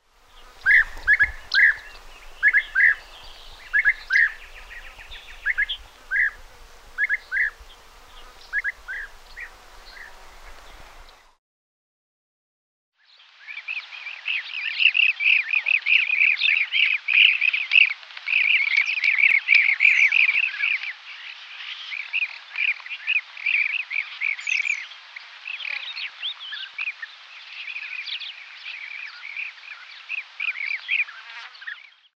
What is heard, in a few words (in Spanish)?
abejaruco, aves; abejaruco europeo; los seres vivos